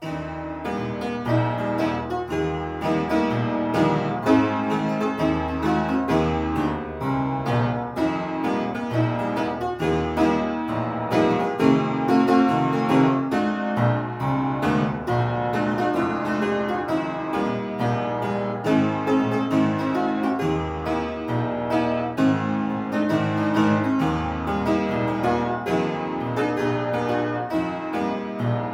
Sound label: Piano mono; dead man's hand; windows; xbox